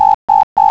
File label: BIP BIP BIP